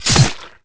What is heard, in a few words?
sword in body; Pirates Of The Caribbean; DUEL